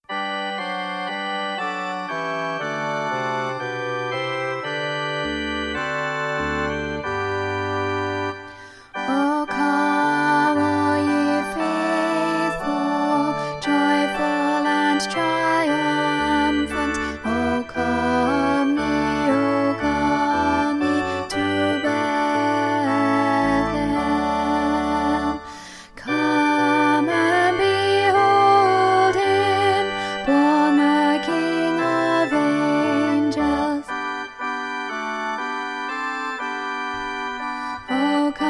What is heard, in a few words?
O Come All Ye Faithful alto, choir